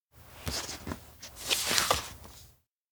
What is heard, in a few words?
sketchbook open careful; anemoi